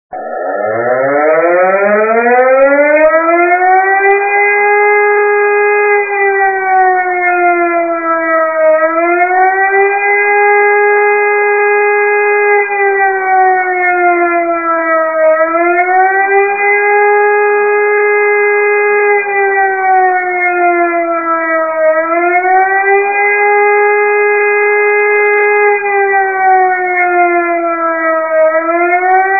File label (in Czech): elektricka rotacni sirena